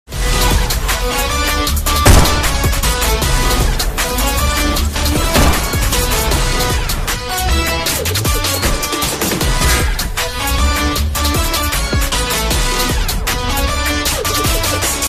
trailer bgm mix background music

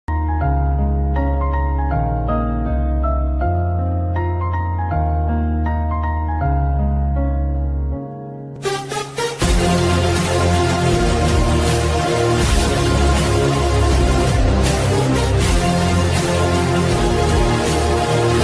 motivational ringtone

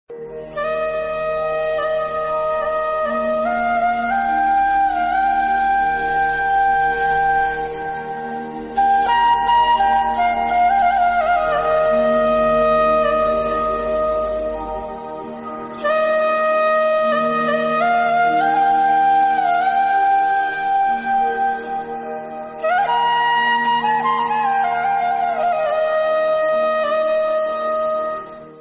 Hanuman Chalisa instrumental bansuri ringtone; hanuman ji

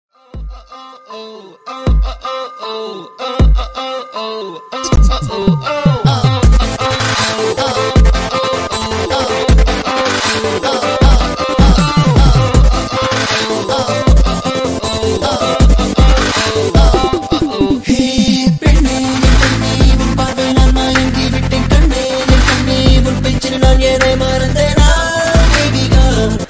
bgm and ringtone

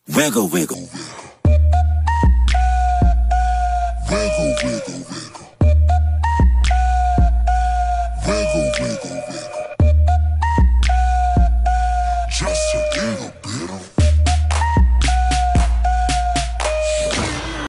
kuku kuku kuku bass boosted ringtone bgm

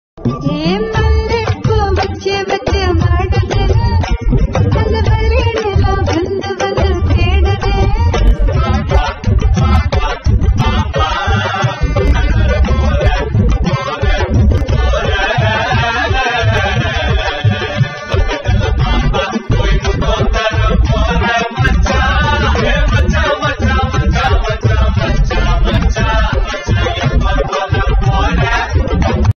love bgm

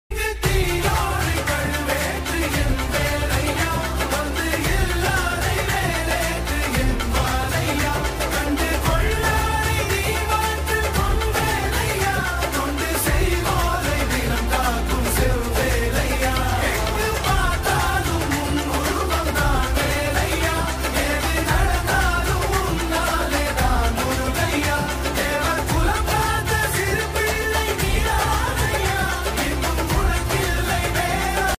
murugan song ringtone bgm

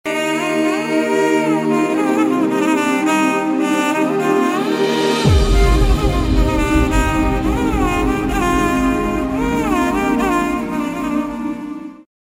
violin bgm

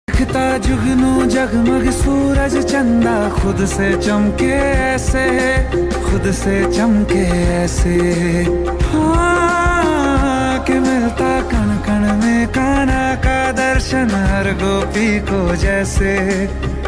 song ringtone bhakti song ringtone

